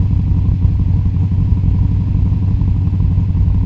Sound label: Audi R8 Plus Idle